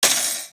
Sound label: tools, wood metal; wood metal SFX